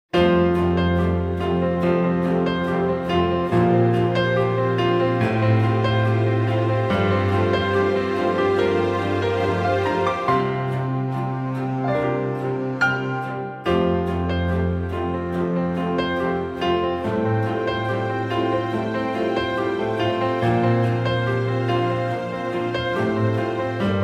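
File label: F orchestra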